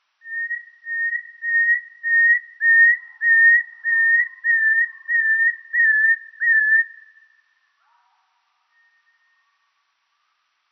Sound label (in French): ROITELET; TRIPLE MOINS DEUX OCTAVES